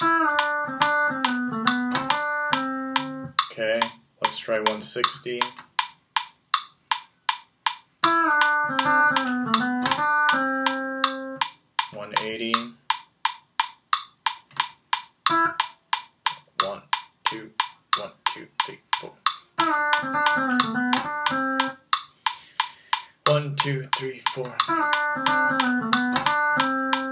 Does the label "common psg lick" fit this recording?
Yes